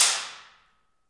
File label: PRC ALMWA, Aluminum Wall; Rhythm Section; FX Perc